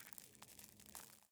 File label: ambient; World of Tanks